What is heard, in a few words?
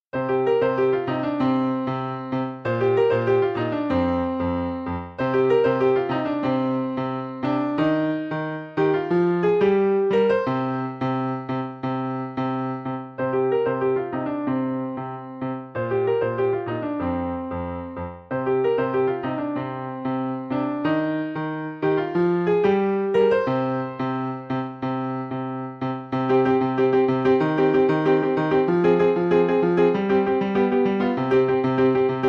Urban Beat